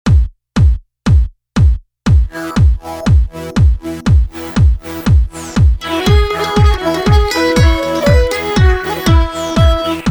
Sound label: Drum Inst. Dance Mix; The Yuletide Drum Pash